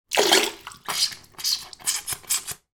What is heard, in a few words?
toy duck squeeze out water several times